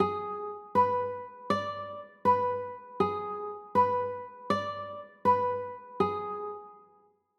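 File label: G sharp dim chord, guitar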